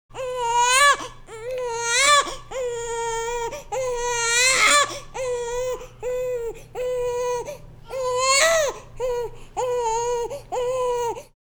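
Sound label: baby cries in hospital; Asian Show Sound Effects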